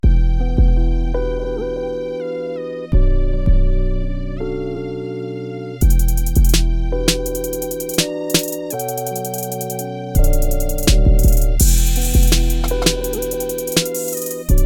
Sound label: Gangster Beats; Gangster Beats Sync Music Licensing for television